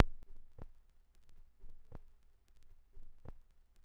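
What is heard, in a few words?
vinyl, click crackle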